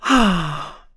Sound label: Menu; Medi